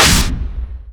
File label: follow thru, large blade; dota